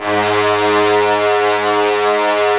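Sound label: brass trombones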